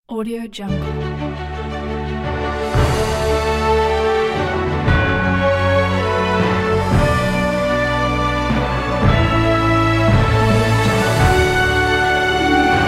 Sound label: award logo